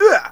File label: Male Hurt; gamerip; Mars Sortie